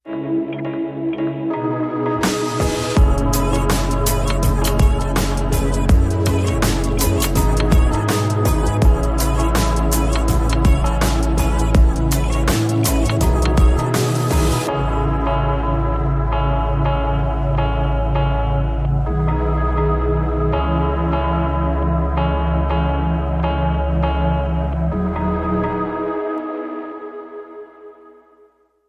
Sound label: Daughter Drums